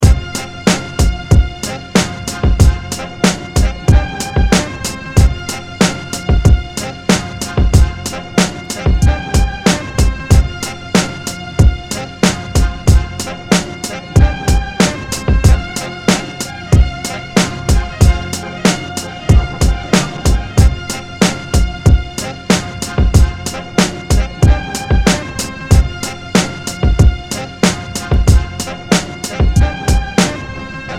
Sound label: Hot instrument